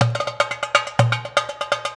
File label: PERC, Gipsy; Best Service; Ethnic Journey Sound Cube